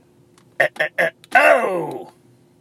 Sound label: uh uh uh oh